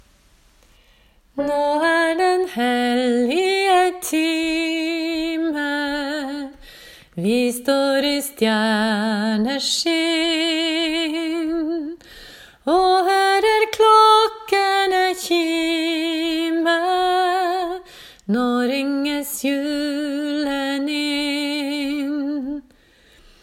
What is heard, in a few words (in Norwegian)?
sopran; en-stjerne; åfjord